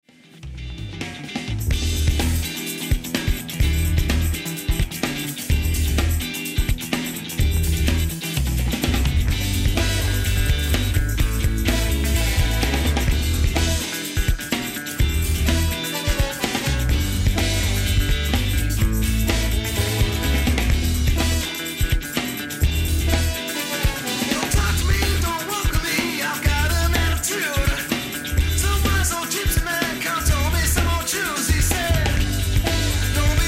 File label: Funky